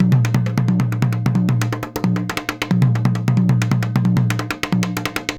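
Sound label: junjunba groove; Best Service; World Colours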